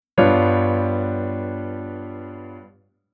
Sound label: Chord Tension 11